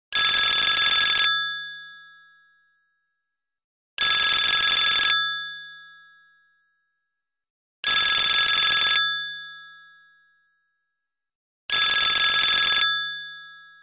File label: Old Phone